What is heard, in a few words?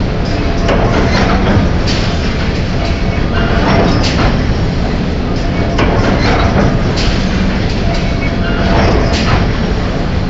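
ambience industrial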